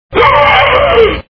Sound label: gasp; courage